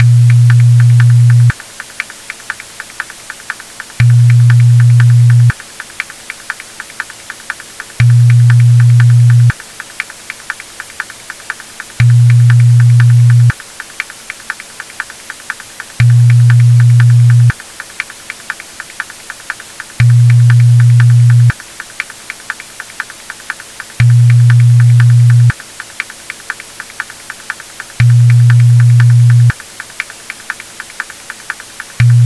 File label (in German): Klingelton